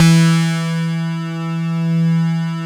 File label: PERC BA; Synclavier Sampler Library; KEYS AND GUITARS